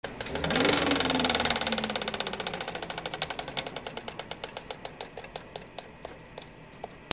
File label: CAMERAS